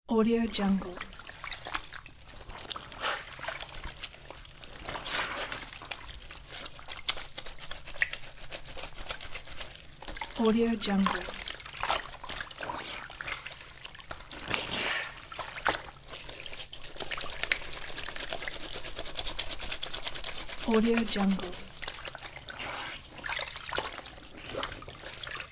hand washing clothes

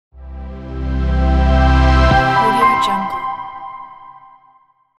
logo intro

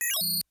tone clusters mostly high pitch tones; prosoundfx

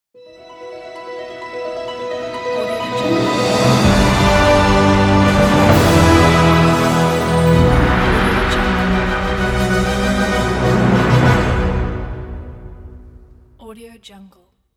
hollywood orchestra logo; praskmusic

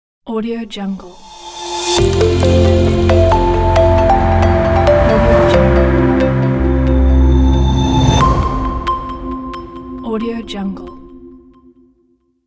elegant ident

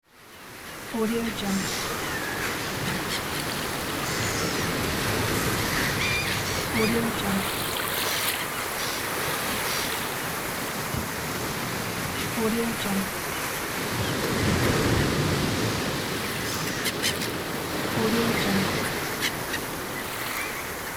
sea

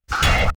vapour lock release; sound ideas

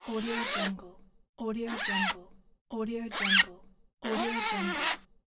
zippers

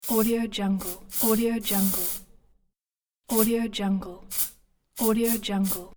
deodorant can spray sounds

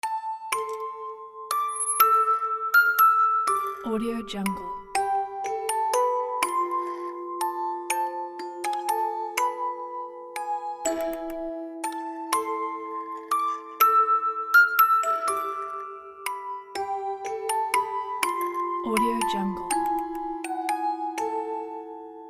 music box; dauzkobza

